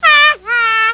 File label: haha; kids